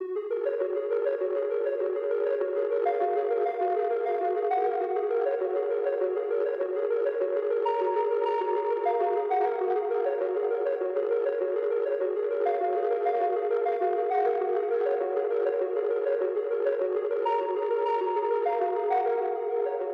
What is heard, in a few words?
100 BPM D# Min Arp, Pop; Cymatics; GHOST (Beta Pack); Broken Heart